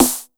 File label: S909 EQ, EQ SNARE; Masterbits - TR-Minator